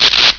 spark, buttons; source engine; hl1; video games

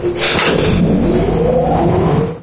elevator large start; Source Engine

